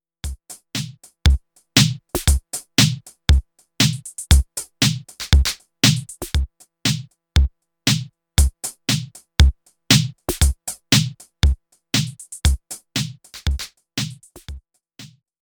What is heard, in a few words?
pattern with delayed hat